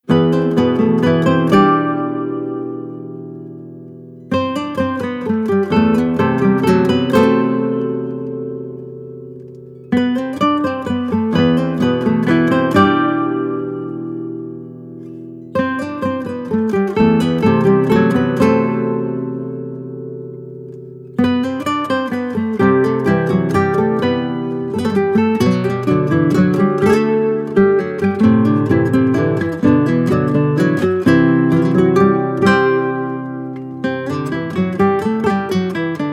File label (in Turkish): Gitar Versiyon